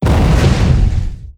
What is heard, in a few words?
spikespore hit; weapons; Stellaris